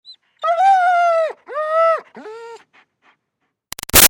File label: Dog German Shepherd Whine Calling Out; Dogs (Sound Effects:Animals); Big Room Sound